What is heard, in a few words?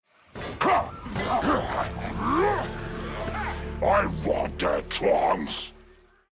stream alert donation